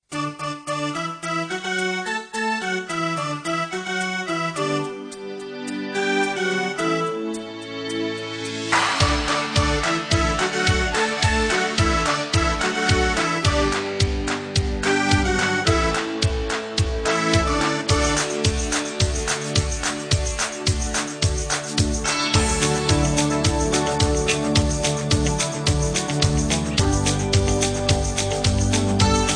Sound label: instrumental